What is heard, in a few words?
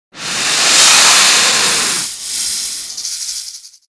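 snake poison attack cast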